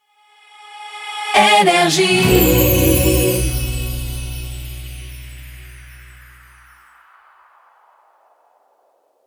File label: Jingle Rapid Fire; NRJ